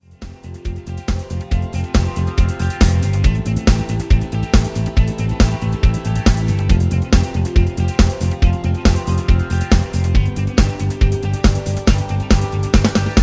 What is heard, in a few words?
rhythm